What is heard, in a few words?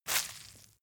leather impact mud soft foam